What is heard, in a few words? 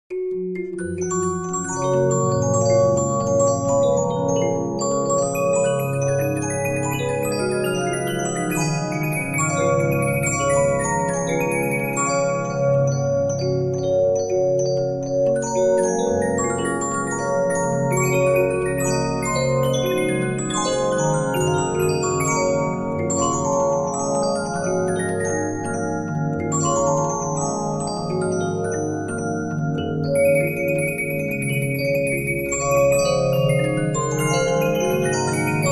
Regina, 15inch disc musicbox